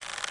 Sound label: ropes creak; dreambuilder modpack